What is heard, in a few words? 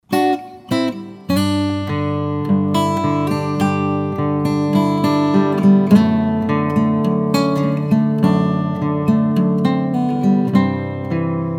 parlour guitar sitka spruce top